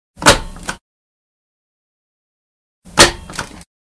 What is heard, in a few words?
Hole Punch; 100 sound effects